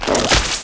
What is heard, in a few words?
airboat leech attack